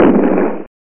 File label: demon lord fireball